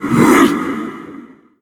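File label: attack vox; gundyr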